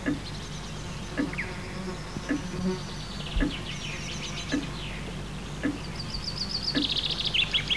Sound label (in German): atmo Innen; Loewe1